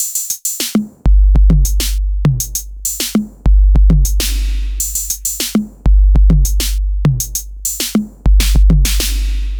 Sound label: Percussion Loop - 100 BPM; Eruption; Cymatics; ANALOG EVOLUTION Beta